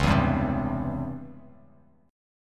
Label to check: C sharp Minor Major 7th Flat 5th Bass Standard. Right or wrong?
Right